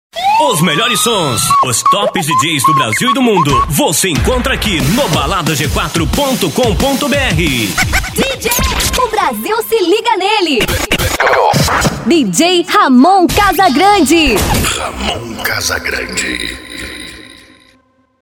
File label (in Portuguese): mega funk; balada g4 top 5